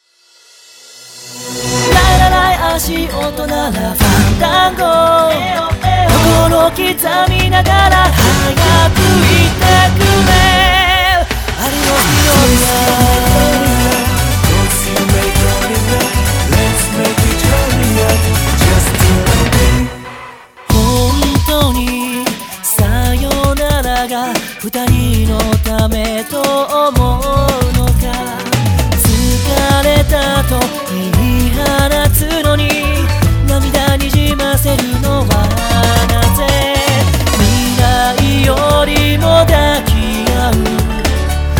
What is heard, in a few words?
Pops